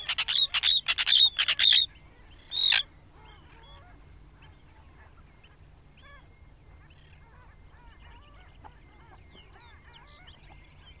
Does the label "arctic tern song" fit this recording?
Yes